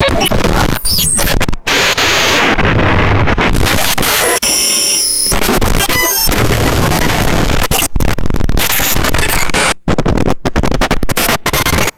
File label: harsh distorted digital glitch and static; designed